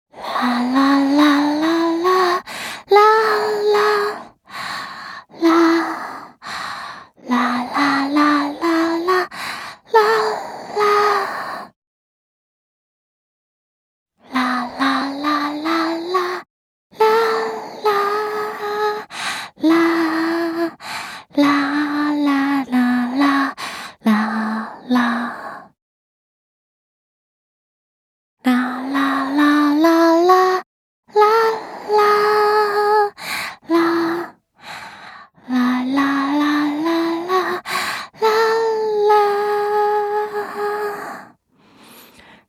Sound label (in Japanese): 子守唄 リバーブなし; 楽曲